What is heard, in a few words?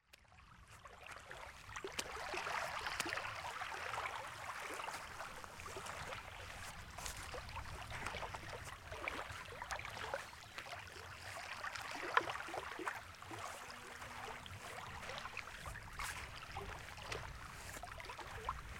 Walking near Lake; Wisconsin